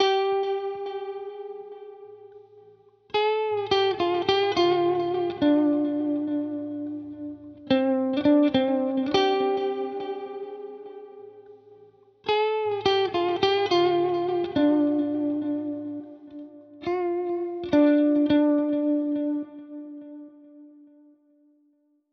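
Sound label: Lead, 105BPM Dmin; 99Sounds; Monosounds; Music Loops; PANDORA BOX!; Jimmy's Style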